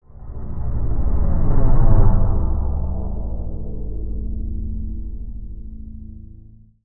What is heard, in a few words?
SPACE SHIPS; SpaceSounds